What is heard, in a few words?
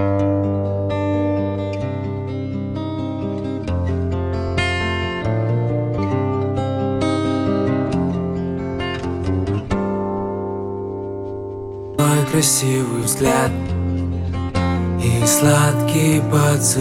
Acoustic